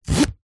foley cardboard cut single slice with serrated knife